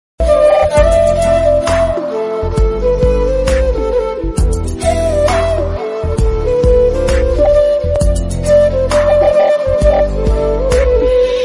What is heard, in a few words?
instrumental bgm